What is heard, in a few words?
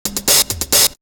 Beat; AMG